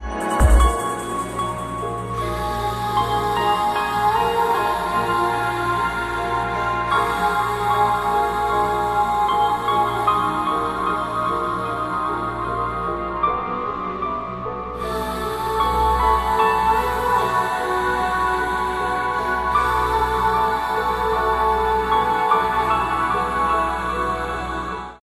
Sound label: Fanfare Remix